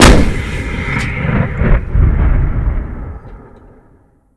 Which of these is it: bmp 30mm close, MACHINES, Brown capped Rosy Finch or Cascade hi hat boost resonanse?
bmp 30mm close